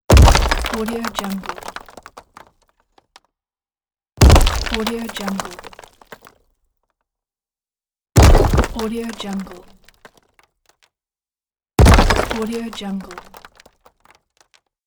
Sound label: rock impact